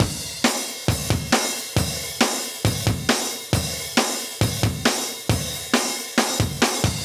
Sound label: 136 live breaks